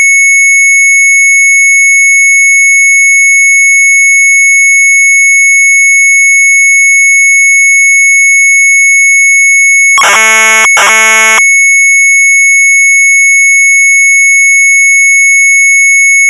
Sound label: Sharp MZ800 Tape; mz800 wav; ETIKETJE